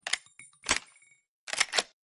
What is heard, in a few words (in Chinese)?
QBZ95 huandan